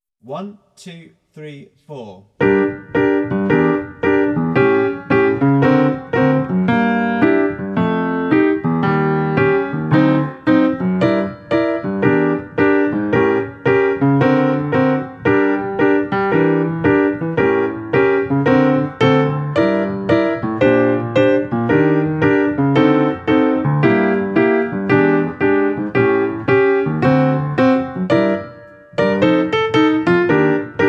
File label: Piano; Canciones de pociones mágicas